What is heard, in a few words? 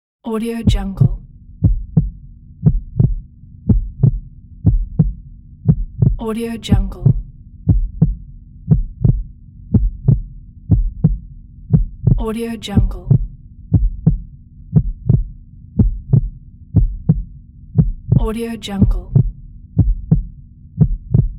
heartbeat; ضربان; human sounds